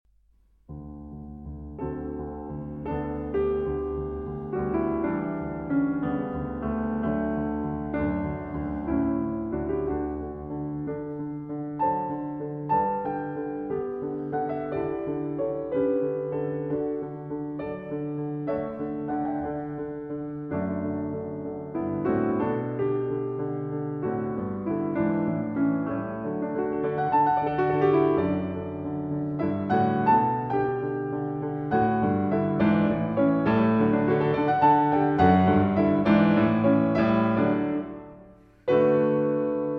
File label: Piano Sonata No. 15 in D Major, Op. 28 Pastoral I. Allegro